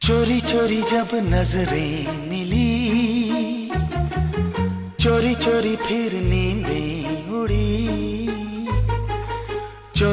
Song Ringtone

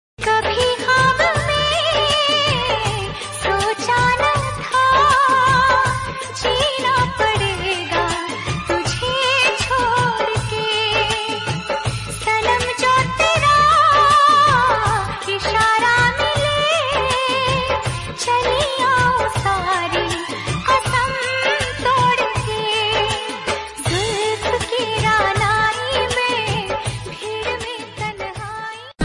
Female Version

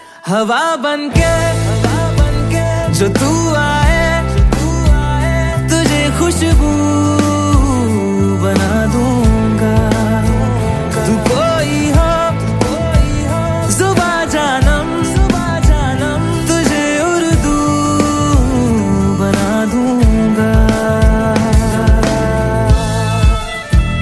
Song Tone

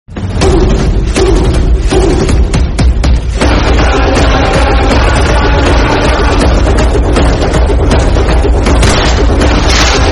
Instrumental Bgm; Antim

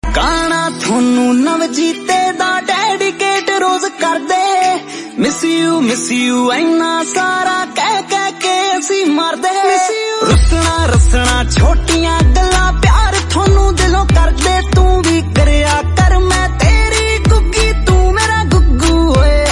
Punjabi Song Ringtone

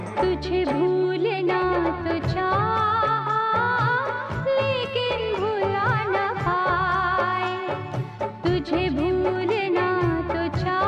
Female Vesion

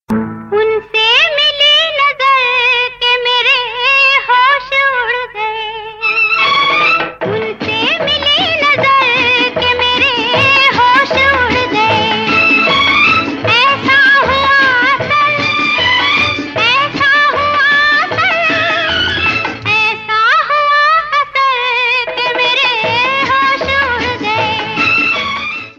Old Hindi Ring

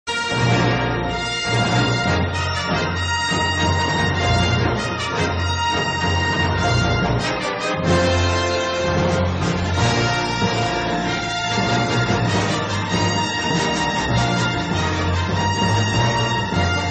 English Ringtone

